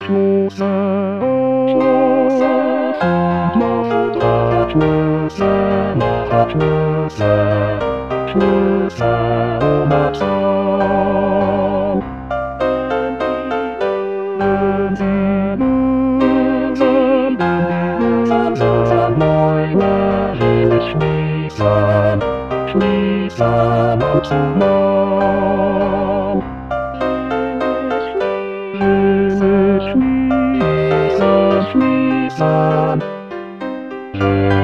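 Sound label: bass; Jubilate; Brahms Waltz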